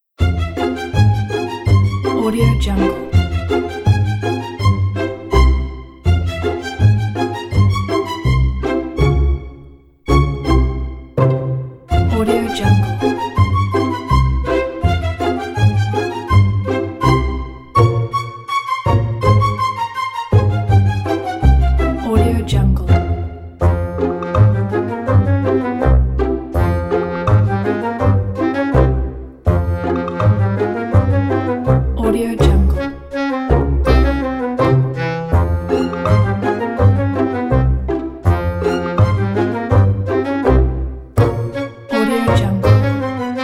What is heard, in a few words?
cheeky fun frolics